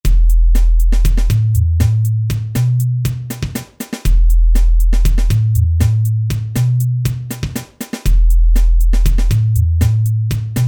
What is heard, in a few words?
Drum Bass